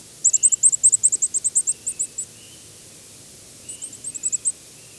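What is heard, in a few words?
Black-capped Chickadee See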